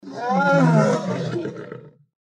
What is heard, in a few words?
deathclaw pain; npc